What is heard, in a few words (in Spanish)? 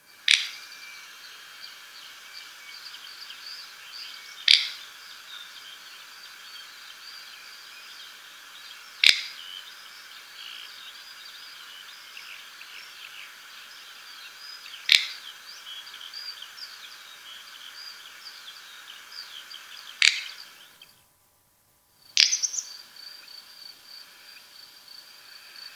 sisón